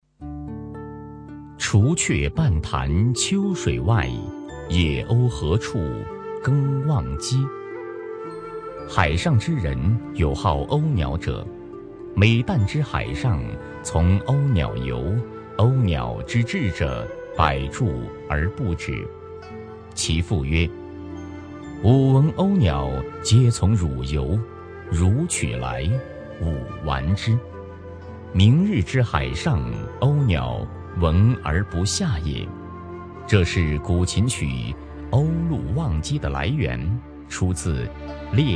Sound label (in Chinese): 琴; 历史